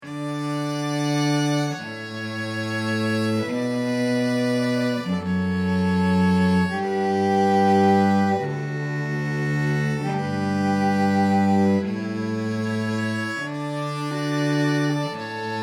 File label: Canon in D